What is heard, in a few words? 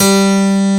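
piano attack G3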